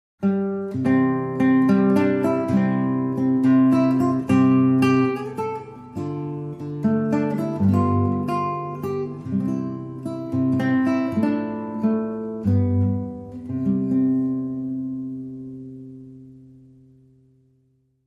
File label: Solo Guitar